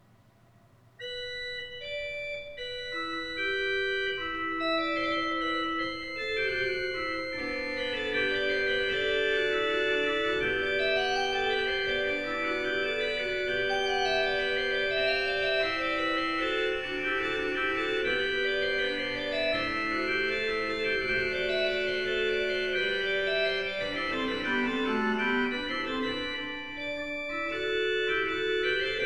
Chorale Prelude, Organ; ''Meine Seele erhebt den Herren''; Johann Pachelbel; Complete Organ Works

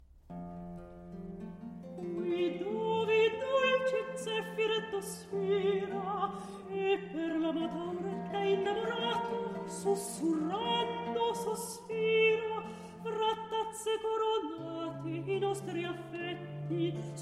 Recitativo; Orlando Furioso, RV 728, Act II, Scene 11; Vivaldi; Jean-christophe Spinosi

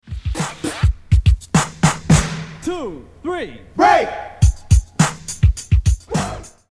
Vocal (Break)

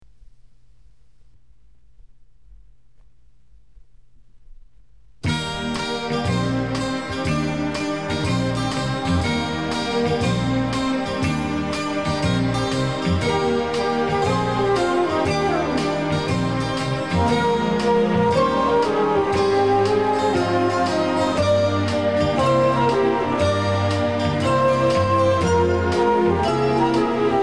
Strings Orchestra

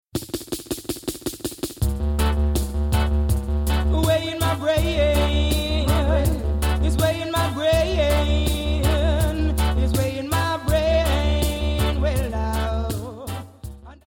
Bass

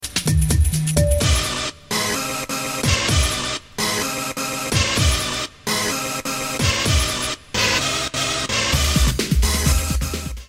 Synth

